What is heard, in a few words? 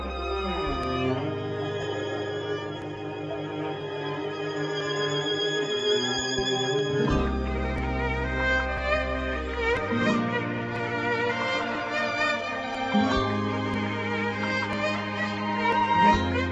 Romantic